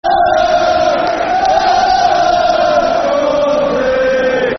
La La Swansea Football Chant (Soccer Song); Swansea City Scfc

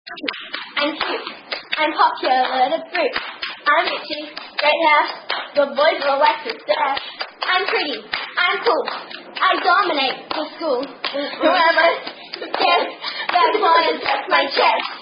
Australian Girls Clapping Games

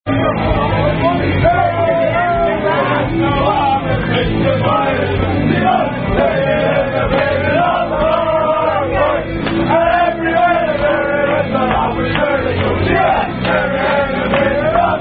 Mary Had A Little Lamb, Rugby Song; Unknown Rugby Song

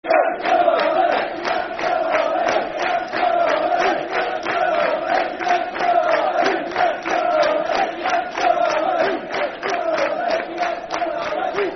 Football Chant (Soccer Song), Brighton; Dicky Knight's Blue And White Army; Brighton Bhafc

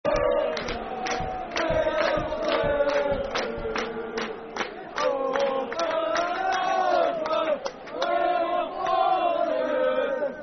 Football Chant, Dundee United; We're United Supporters; Dundee United Dufc

